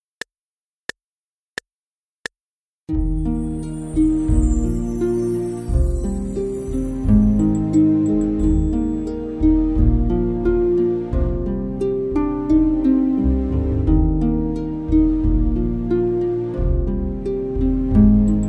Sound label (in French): aurore boreale accompagnement